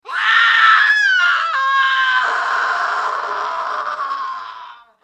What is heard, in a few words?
Scream Woman Pain